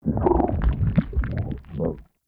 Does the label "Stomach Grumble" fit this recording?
Yes